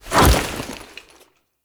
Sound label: Foley Melee Sweep; Warframe; Kahl